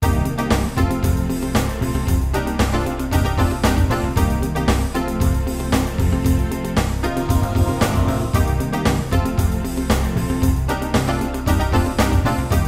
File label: funky, Coded at 128 kbit/s without PNS